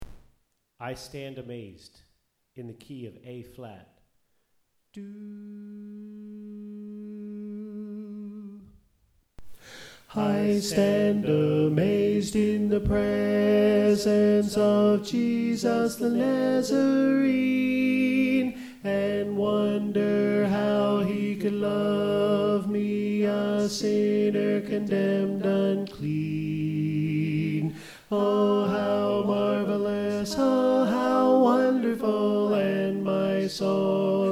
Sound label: Tenor